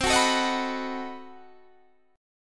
B 7th C; B7/C; strummed

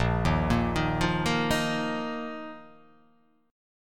A sharp Suspended 4th; arpeggiated; A#sus4; JGuitar

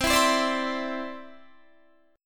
C Major Add 9th, Guitar DADGAD

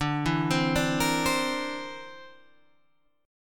D 7th Suspended 2nd Sharp 5th D

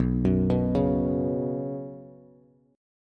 B Suspended 2nd B down Bass, Bsus2 chord; arpeggiated; JGuitar

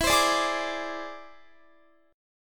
E Augmented 7th E down Guitar; E+7; strummed

